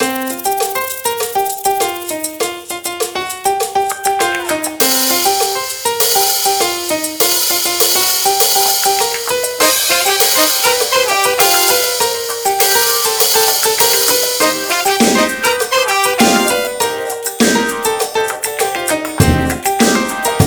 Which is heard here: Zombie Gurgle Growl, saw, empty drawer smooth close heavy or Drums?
Drums